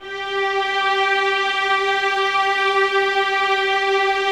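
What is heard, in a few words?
2nd violins sustain g4; Sonatina Symphonic Orchestra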